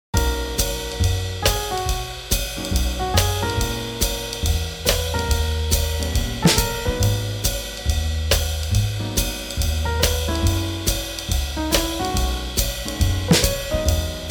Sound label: Swing Jazz